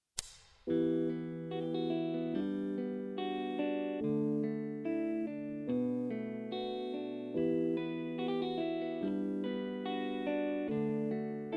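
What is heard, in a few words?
minus track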